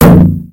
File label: hit